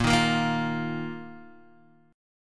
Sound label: A sharp Suspended 4th A sharp down Guitar Open D